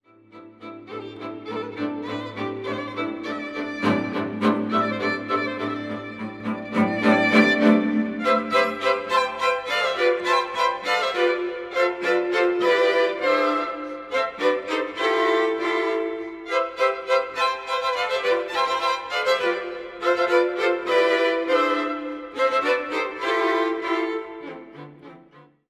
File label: String Quartet; String Quaret no.1